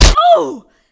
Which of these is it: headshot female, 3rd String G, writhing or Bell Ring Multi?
headshot female